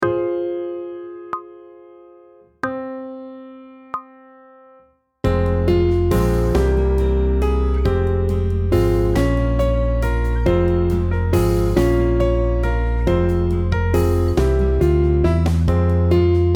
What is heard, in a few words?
aural tests harm of a melodic phrase; pop vocals; grade 7